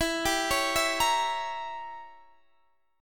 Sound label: E Diminished 7th E